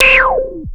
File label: Industrial Analog Single Notes Short